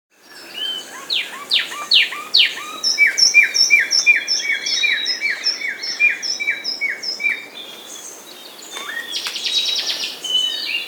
Fulvous Wren (Cinnycerthia Fulva), Andean Birds; S. America

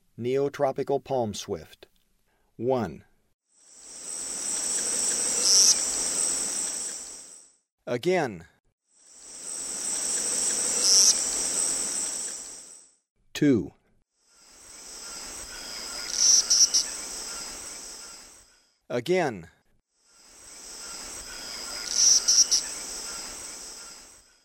Neotropical Palm-Swift; S. America; Eastern Lowlands

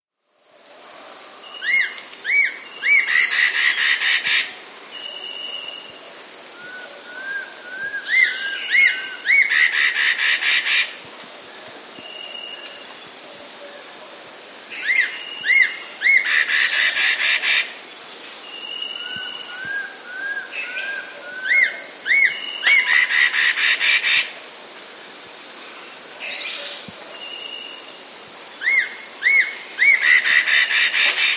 Pearly Antshrike; S. America

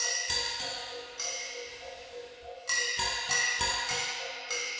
Kabuki Metal, Rhythmic Atmospheres; Ambient Cinematic

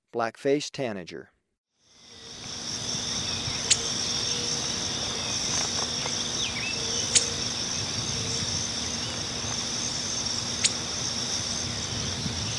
Black-Faced Tanager, Birds of Ecuador; S. America

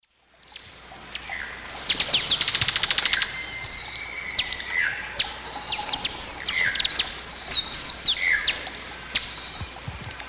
Topaza pyra, feeding and chase calls; S. America; Eastern Lowlands

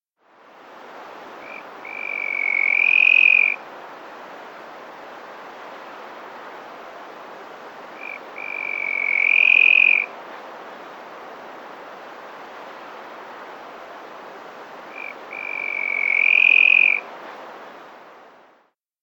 Stripe-Headed Antpitta; S. America

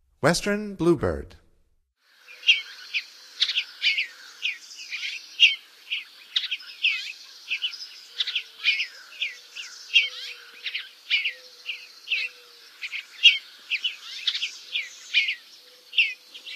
Western Bluebird, Songs of Birds of North America; Stokes

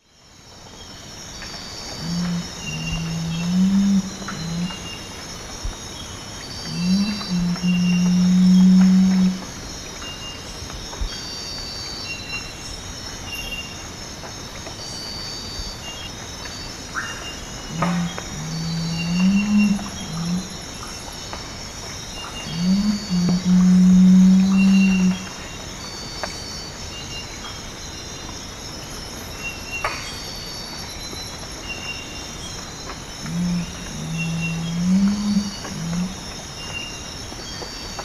Mitu salvini, song; S. America; Eastern Lowlands

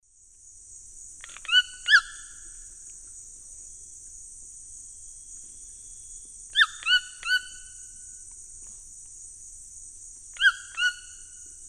Ramphastos tucanus, song; S. America; Eastern Lowlands

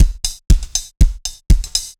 dancefloor tight 120; loops; 120bpm

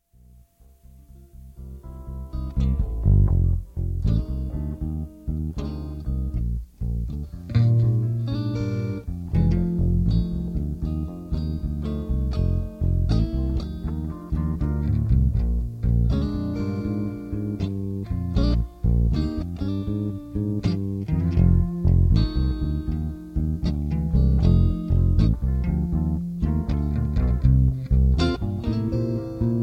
B Minor Jam

